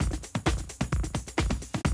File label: 130, Classic DrumLoops